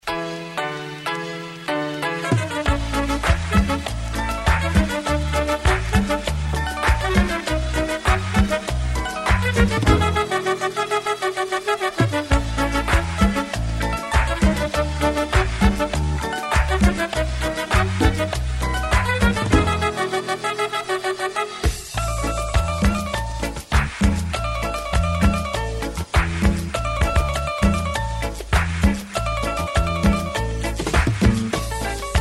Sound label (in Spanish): instrumental